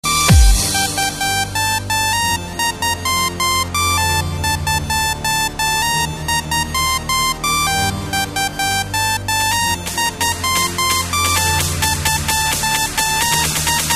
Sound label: flying notes